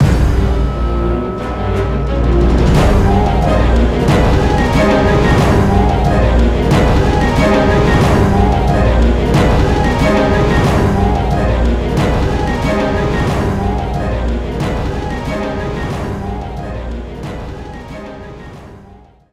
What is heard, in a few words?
bgm loop; monster hunter 4 ultimate